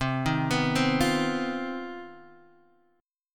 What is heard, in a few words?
C Minor Major 7th Double Flat 5th chord, Guitar Drop D